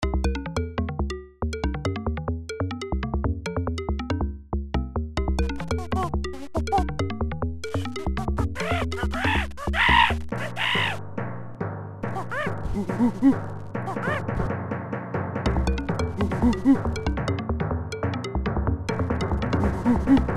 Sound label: tribal